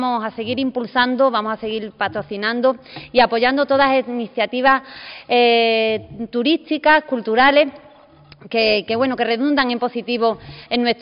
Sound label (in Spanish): Flamenco; Vejer Flamenco